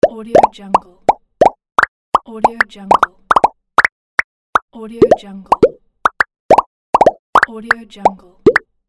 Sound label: pop sound effects pack; medusamusiclab